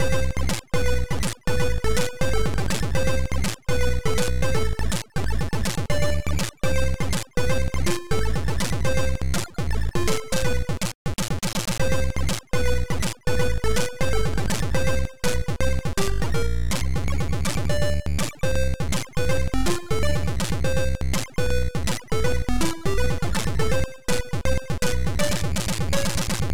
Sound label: quick mix